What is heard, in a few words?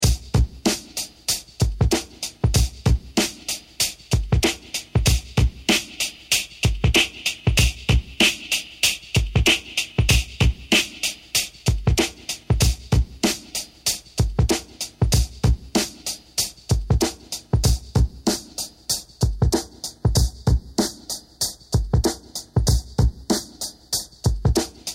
Serge RES; SergeModular